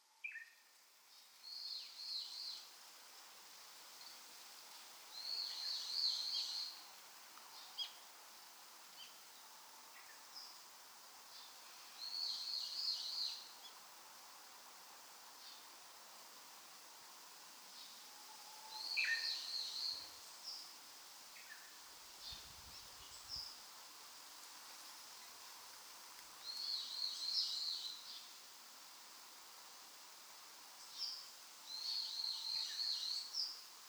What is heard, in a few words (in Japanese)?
自宅近くの公園の池で録音したうぐいすです。; 2025年5月3日に、自宅近くの公園の池で録音したうぐいすです。; 野鳥の声